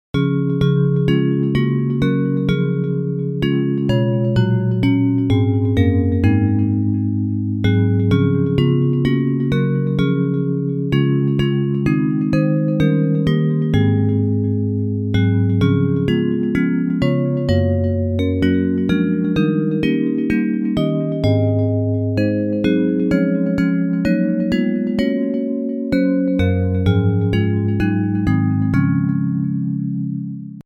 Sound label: Bells